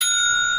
service bell call bell

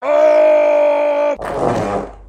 fart; sprunki raddy

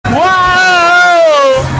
waaahahaaaoyoooo

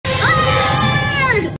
dog screaming; doki the dog

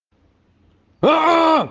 grito

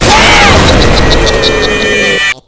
jumpscare; gmork

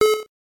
cowbell

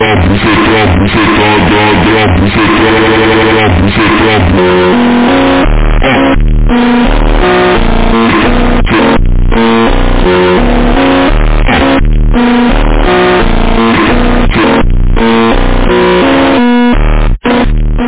phonk

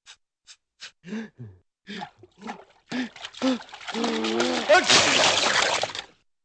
man sneezes and sprays water

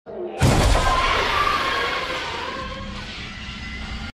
scream; demogorgon

